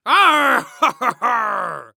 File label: Human Pirate Laugh